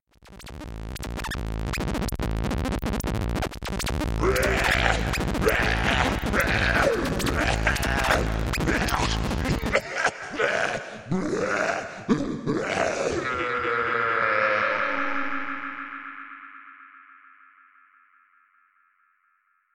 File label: oooueeergh